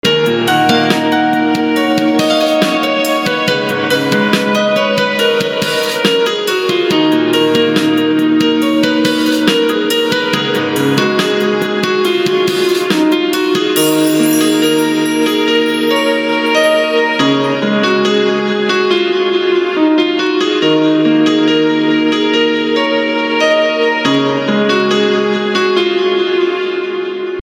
Piano Instrumental Ringtone

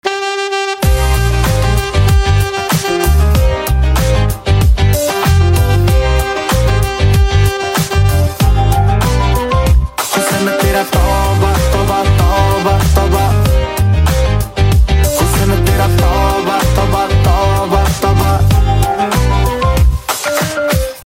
Saxophone Ringtone, punjabi instrumental ringtone

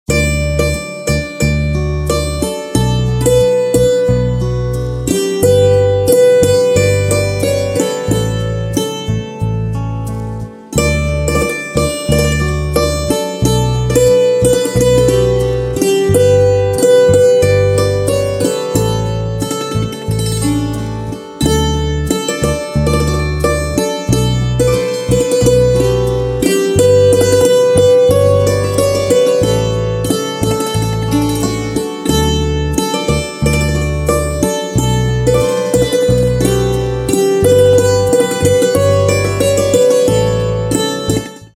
Santoor Instrumental Ringtone

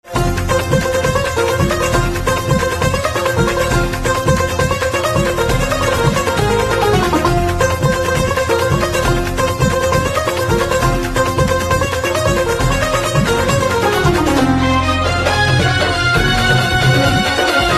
Sitar Music Ringtone

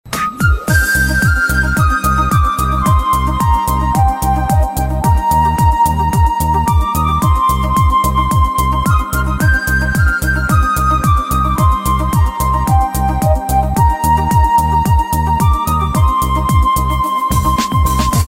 Music Flute Instrumental Ringtone

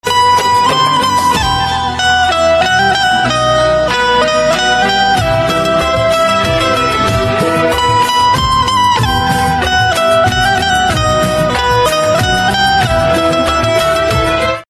Banjo Instrumental

